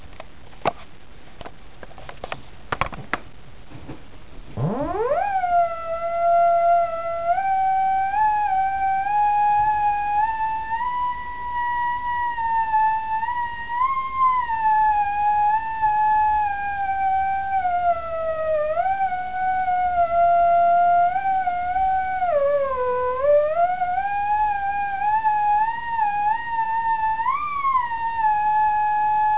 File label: furusato theremin